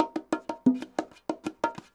bongos 120, latin, 120bpm; Sound Effect Library

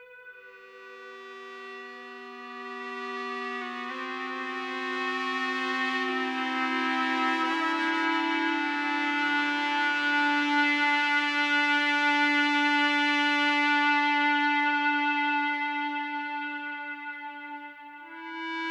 E-bow; Ambient Cinematic; Soundscapes

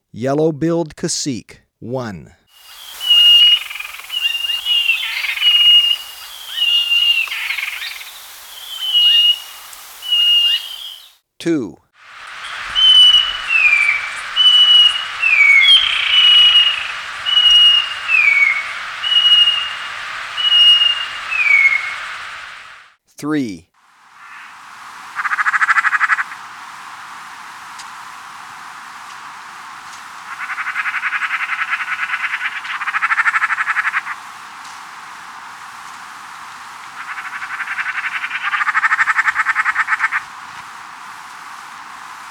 Yellow-Billed Cacique, Birds of Ecuador; S. America

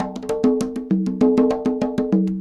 conga 100, latin, 100bpm; Sound Effect Library

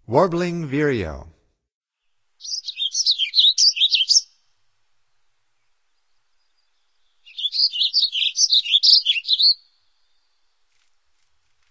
Warbling Vireo, Songs of Birds of North America; Stokes

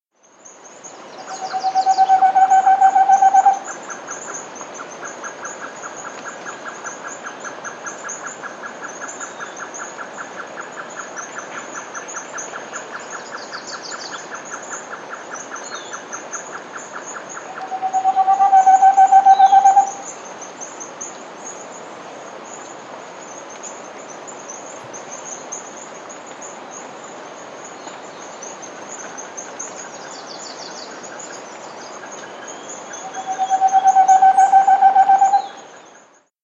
Moustached Antpitta, S. America

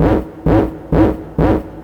Synth, Techno

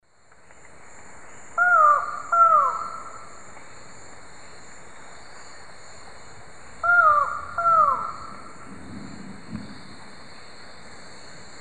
Micrastur buckleyi, calls; S. America; Eastern Lowlands

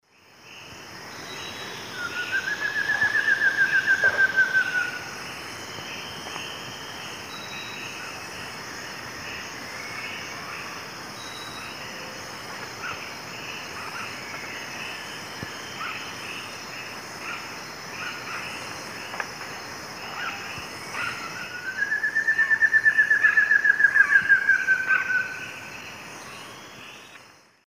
Xiphorhynchus guttatus, song; S. America; Eastern Lowlands

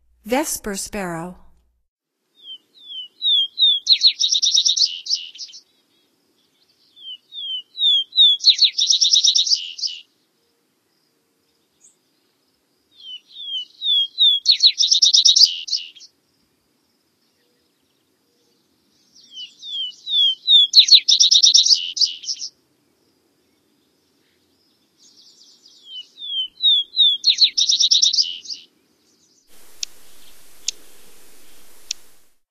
Vesper Sparrow, Songs of Birds of North America; Stokes

